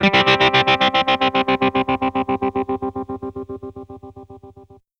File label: Guitars; Zero-G; Total Drum Bass